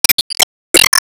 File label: Enemy Pirate Anchor Hangdown